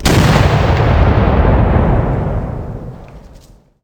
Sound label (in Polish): wybuch, armata z oddali